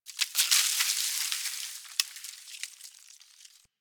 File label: Venus Landscape Ice Slide Small; Ambience; Warframe; Venus Open World